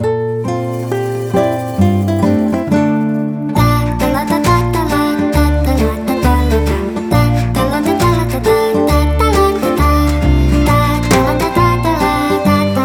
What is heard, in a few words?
Acoustic Remix; Wuthering Waves